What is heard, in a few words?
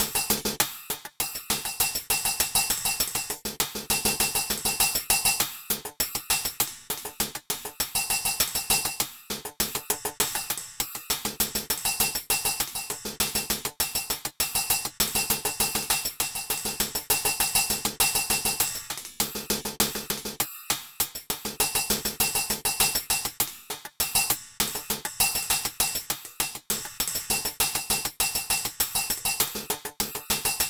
Cymbalz N Hatz More, Percussion Combos, 100; M-Audio; Pro Sessions VOL-38; Abstract World Fusion 2